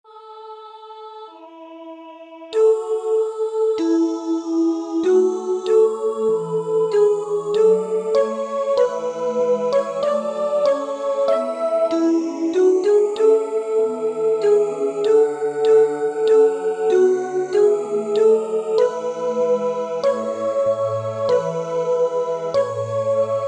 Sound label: Alto; Confirma Hoc